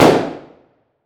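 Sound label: weapon ar fire player atmo interior; gmod; viper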